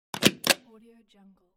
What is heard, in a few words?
stamp approved